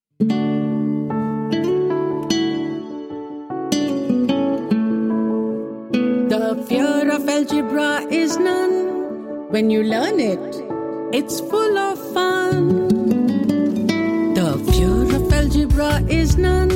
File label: Jingle